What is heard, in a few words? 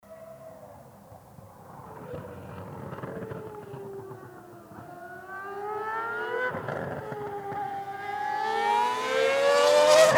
Mercedes W02 F1 Car Accelerating Fast Out of Chicane Sound; Mercedes Petronas W02